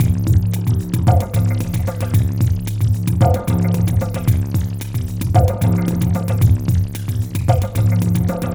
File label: Quantum, Processed Percussion